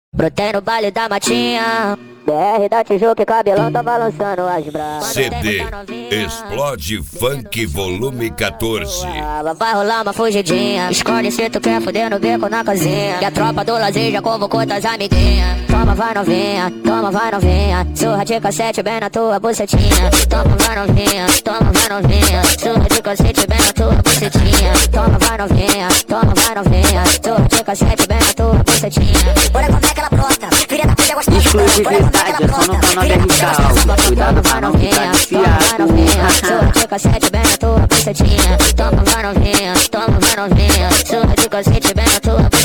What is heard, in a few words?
funk